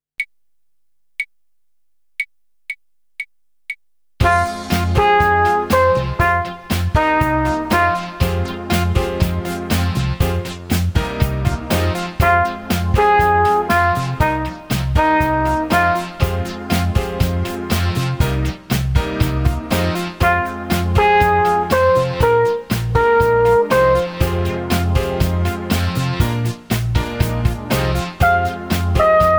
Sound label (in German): Echo Ubung